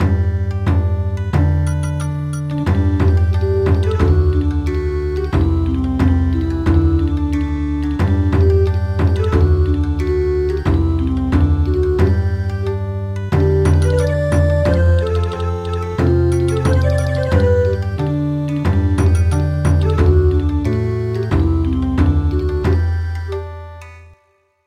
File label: Bon Odori